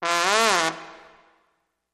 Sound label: Trombone Low Gliss Up and Down